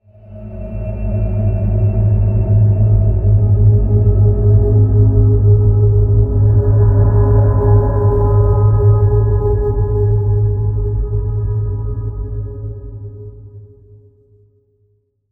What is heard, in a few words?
environment stinger synth; Aragami